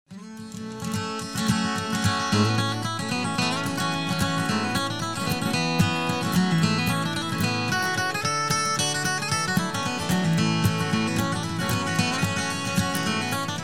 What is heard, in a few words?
Fiddle tunes